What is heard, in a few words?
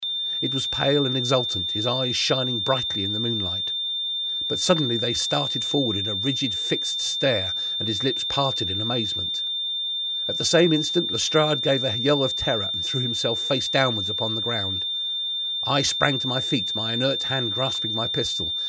Tinnitus; Hearing Loss Demo